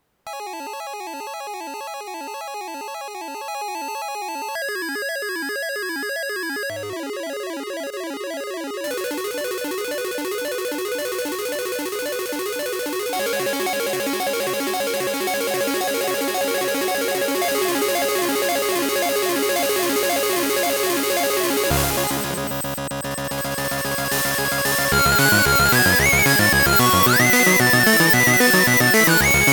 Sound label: FPGA GB - dual 64 tap fir filter proper pitch; gameboy sound samples